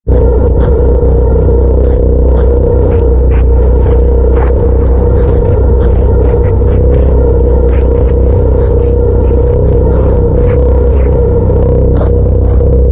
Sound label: message packets multiple; ambient; outro; source engine; episodic